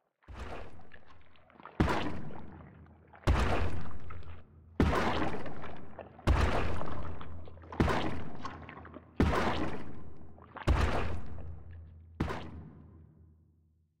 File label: muddy elemental patrol, creatures; michael ghelfi